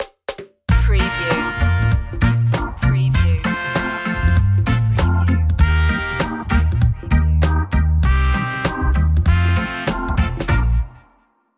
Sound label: reggae party stinger